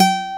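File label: Spanish A3, guitar; AKAI S-Series CD-ROM Sound Library VOL-8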